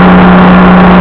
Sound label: motor deep